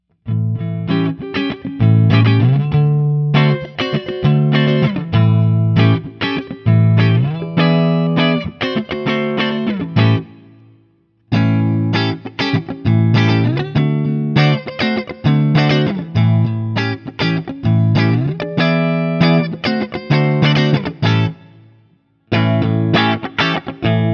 Guild Starfire I SC ODS100 SC; Guild Newark St Starfire SC

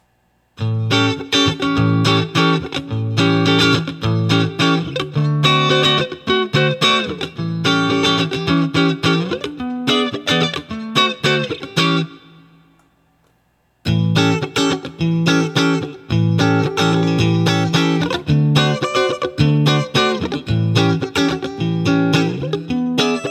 Guild 85 S284 EMGs 7th; Guild S284 Aviator with EMG pickups

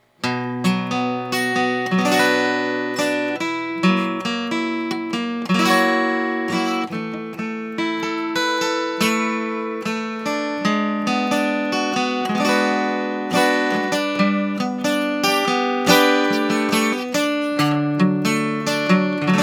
Guild D46; acoustic guitar; 1985 Guild D46 acoustic guitar

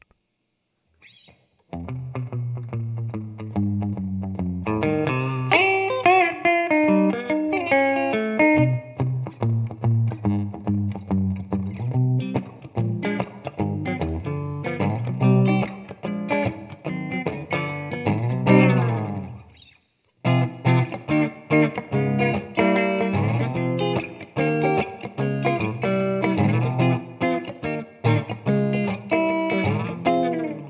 Both Pickups, Guitar; Starfire4

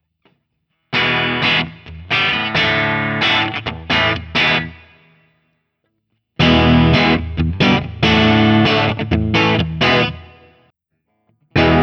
Guild 80s BHM1 ODS100 All Switches; 1985 Guild Brian May BHM1

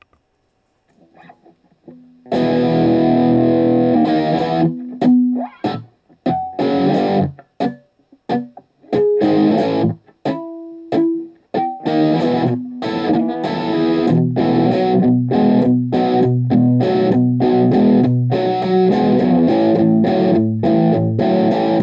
Guild 97 S100; 1997 guild s-100 guitar; Guild’s S100 guitar, which appears on the surface to be a copy of Gibson’s SG

